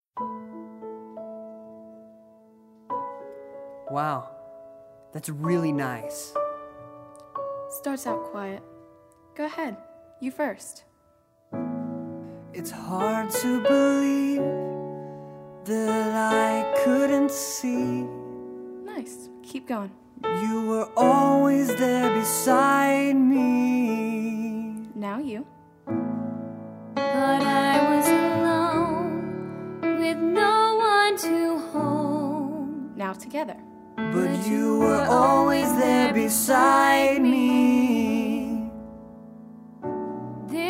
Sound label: Vocal